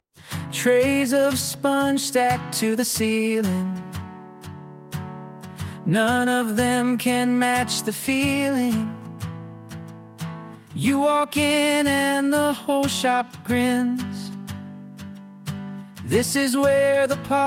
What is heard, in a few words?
birthday, name songs; addison